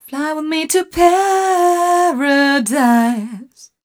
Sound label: f mel e flat, fem Vocals